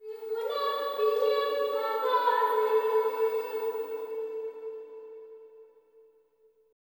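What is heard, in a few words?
LITTLE GIRL, AFR. GIRL; Spectrasonics; Heart of Africa 2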